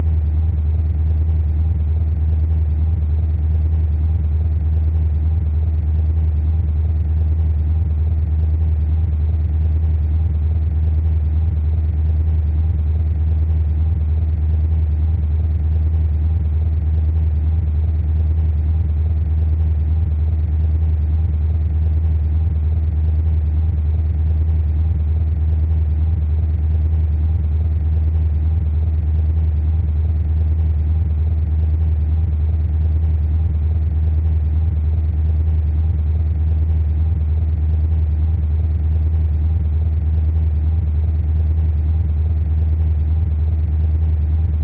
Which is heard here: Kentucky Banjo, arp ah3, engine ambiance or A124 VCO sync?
engine ambiance